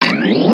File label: auto on; games; poker; All American Poker